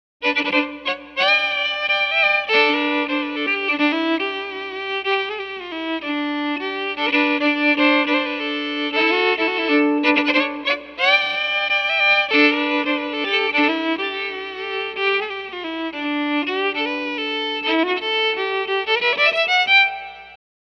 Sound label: Electric violin, Tube Amp; Silkyn Tube Amp